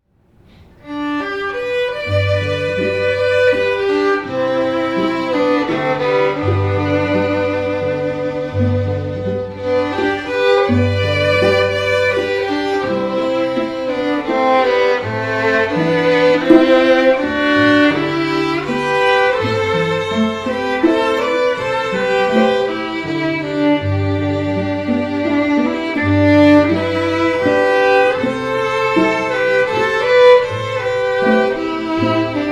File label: Waltz, string quartet; little rock string quartet